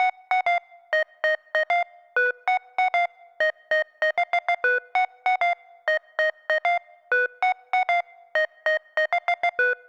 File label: HipHop Synth D#Min; Digidesign; Pro Tools Essential Loops